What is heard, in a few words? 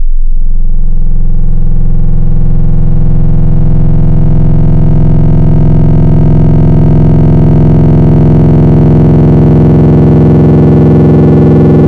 FM Table, FM Wavetables; Cymatics; SERUM